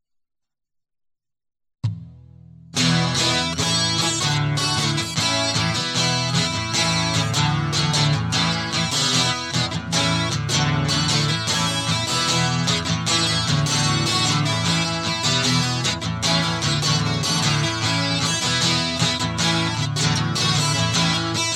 Guitar Loop

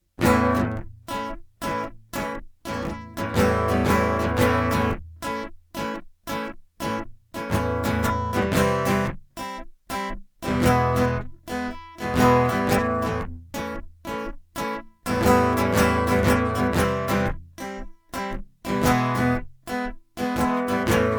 acoustic loop

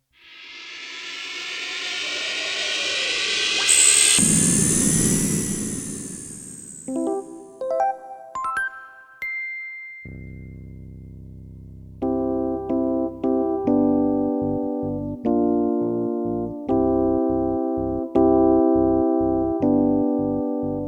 space intro

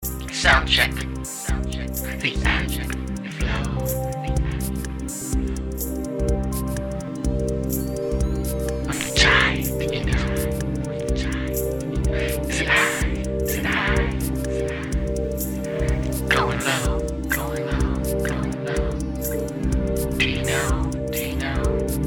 Soundcheck

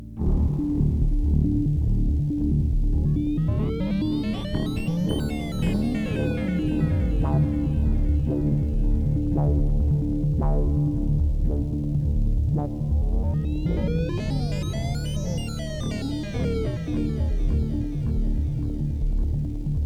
space bass intro

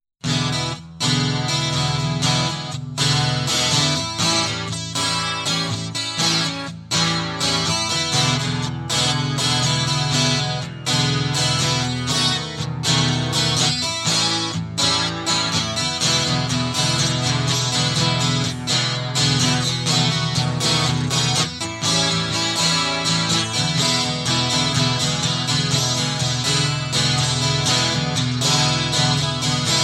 Chill Out Global Warming Soundcheck

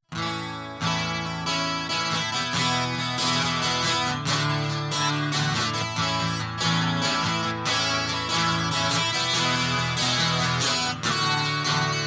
Guitar Loop

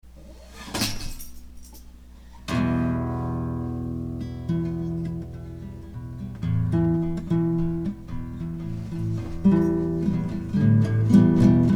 Instrumental

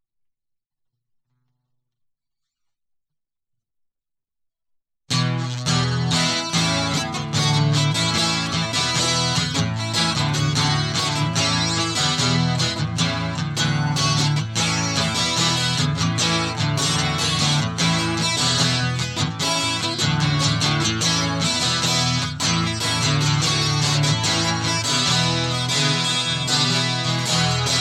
Guitar

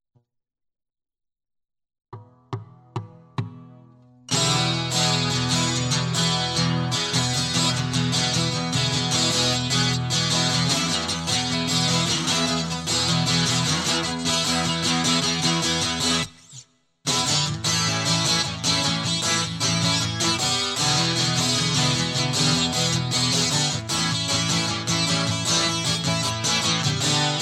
Guitar

